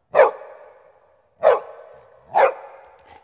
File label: Loud Dogs Bark